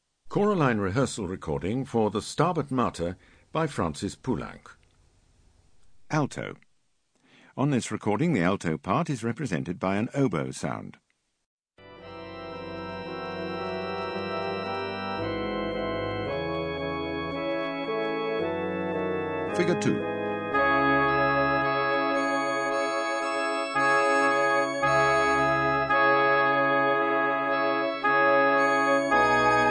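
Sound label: Alto; Poulenc SM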